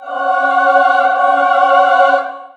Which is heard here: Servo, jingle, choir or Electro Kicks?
choir